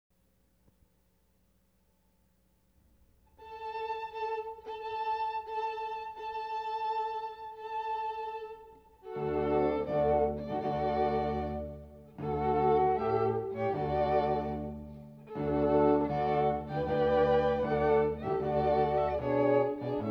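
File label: string quartet; Bridal Chorus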